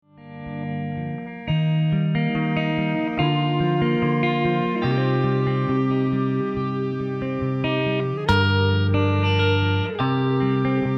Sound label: guitar voix